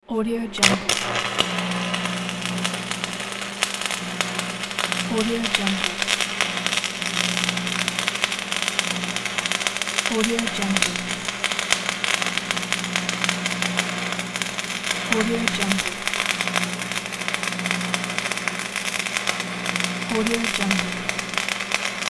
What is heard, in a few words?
arc weld on metal plate; hollywoodedge